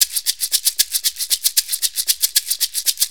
6-8 Maraccas, Perc Loops

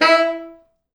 E3, Pop Brass; Best Service ProSamples vol.19; AKAI

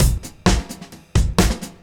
Beat Style A 130, breaks; 130bpm

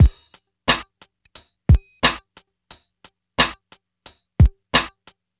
drum, Hip Hop and R&B Drumloops

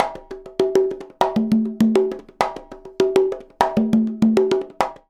CONGA, Modern Percussion Loops

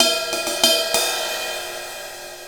Ride Cymbal Pattern

